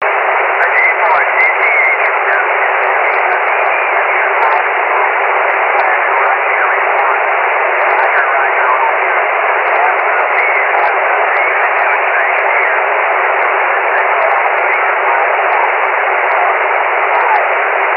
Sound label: ssb, 23cm